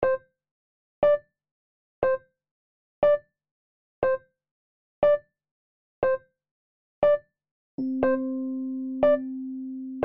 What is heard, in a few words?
Pianoteq E-piano